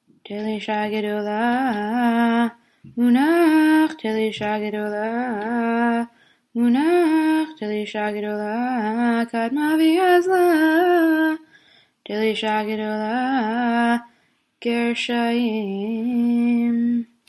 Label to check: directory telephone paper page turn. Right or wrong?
Wrong